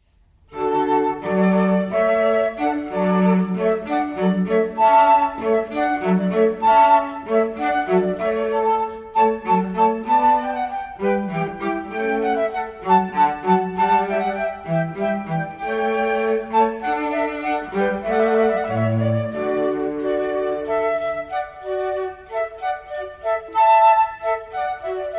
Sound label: hornpipe, classical strings flute